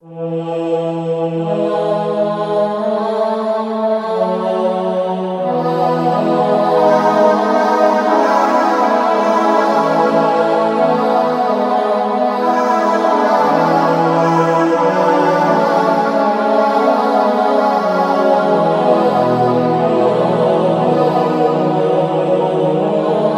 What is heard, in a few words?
Synthesized Vocals; Magnus Choir; Syntheway